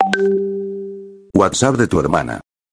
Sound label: Whatsapp; toque de celular